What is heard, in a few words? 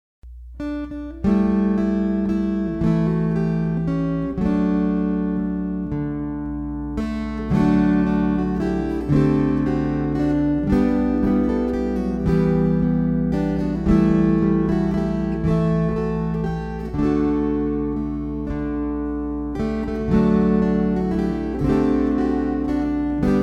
Waltzes